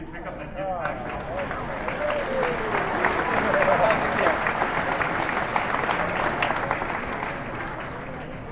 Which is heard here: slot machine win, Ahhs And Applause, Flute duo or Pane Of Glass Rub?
Ahhs And Applause